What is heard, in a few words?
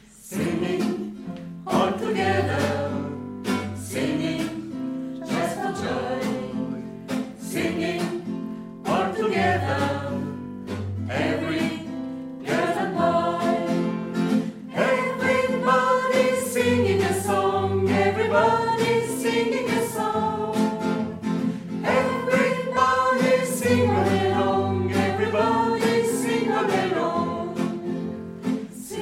singing together